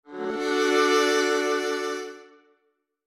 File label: viola d'amore; AJ Hipkins